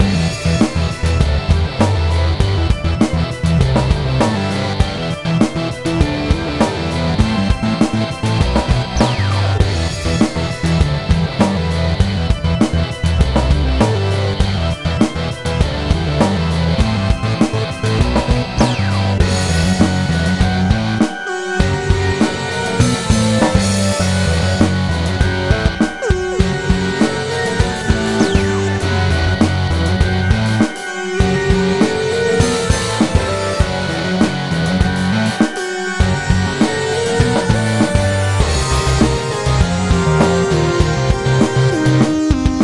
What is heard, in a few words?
Goth Loop; home designer perfekt gestylte zimmer; nds